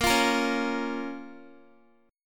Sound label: Bb Minor Bb down Guitar; Bbm strummed